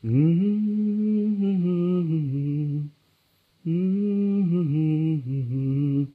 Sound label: humming, stalker